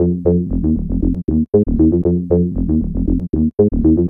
Prog Rok, Funk Bass; Sonic Foundry (Sony Creative Software); Synchro Funk

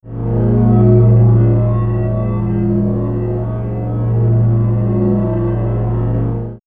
Swirls, pads; Succubi; X-Static Goldmine

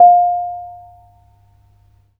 BONANG F5; Spectrasonics; Heart of Asia

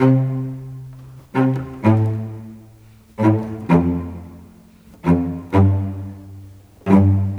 Str Cello; Sonic Foundry (Sony Creative Software); Orchestral Series VOL-3; Cinematic 15

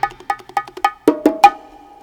PERC RUN; Crypton - DrumBase5000

